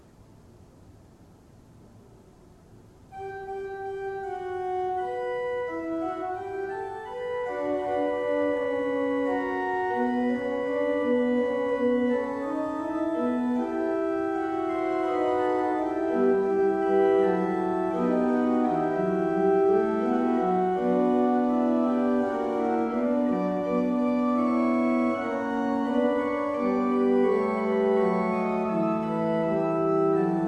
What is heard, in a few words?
pipe organ; Baltes